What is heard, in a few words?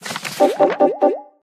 Question Appears